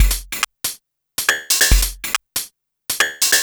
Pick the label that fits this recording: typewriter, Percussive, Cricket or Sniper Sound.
Percussive